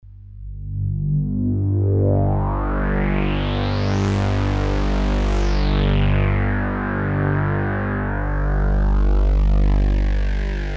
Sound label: filtermorph; opxpro